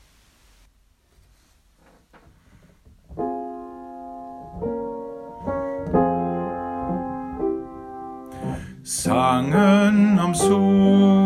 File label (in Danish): Tenor (melodi); Vårvise